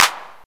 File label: clap one shot e key; percussion; single hits one shots